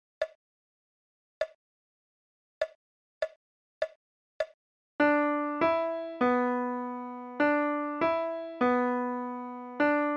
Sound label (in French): Cloches